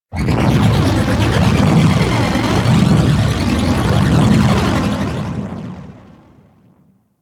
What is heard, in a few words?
Short Bow Charged Projectile; Warframe; Duviri; Dax; weapons